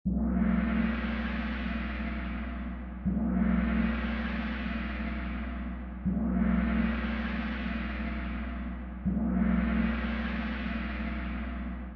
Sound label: orch cymbals, Orchestral Cymbals; Percussion Loops